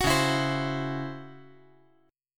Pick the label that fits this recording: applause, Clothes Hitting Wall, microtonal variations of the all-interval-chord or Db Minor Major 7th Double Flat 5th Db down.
Db Minor Major 7th Double Flat 5th Db down